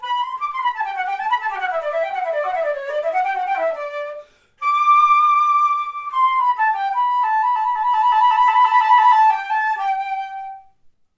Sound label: Cadenza end phrase